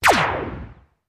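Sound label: Dull Heavy Lazer Shot, 2 Low Pitch Lazer Shot & return Fire Different Caliber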